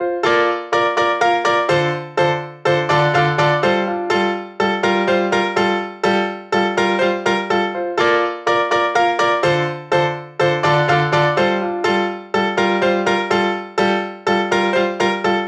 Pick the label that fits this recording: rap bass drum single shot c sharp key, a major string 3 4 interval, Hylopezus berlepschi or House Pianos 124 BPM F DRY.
House Pianos 124 BPM F DRY